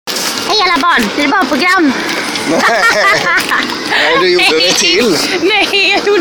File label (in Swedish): helium; hej alla barn